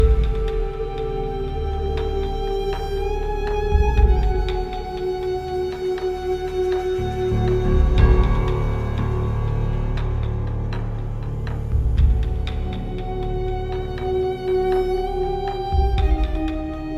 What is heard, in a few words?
Orchestra & Drums; Hostile Negotiations 2; Assassin's Creed III